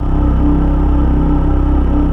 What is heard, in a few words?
VOX, Synthesizers